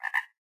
Ambience Frog Croaks; Level17

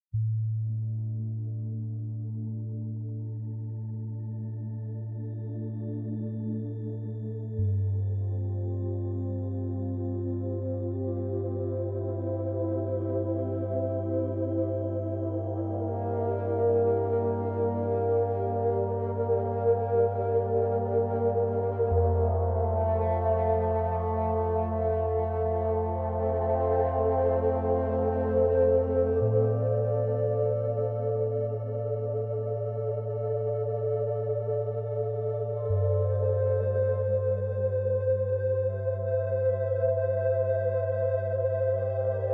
frozen glass- med bass

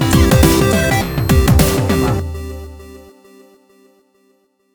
Retry Jingle; Friday Night Funkin Megadrive Madness ost mod windows gamerip 2022

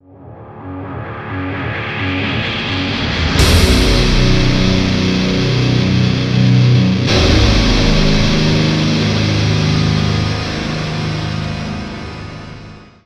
guitar; transformers war for cybertron gamerip; IACON INVASION

